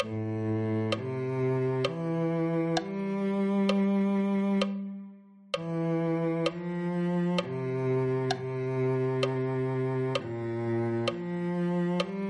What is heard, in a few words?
cello